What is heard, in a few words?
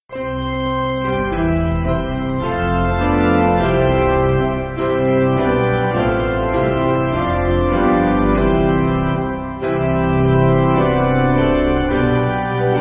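Piano, Music Backing; Compton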